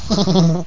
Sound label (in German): lachen